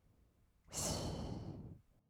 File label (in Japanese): 吐く音, ブレス, kawaii voice; ASMR; 1200 kawaii voice!!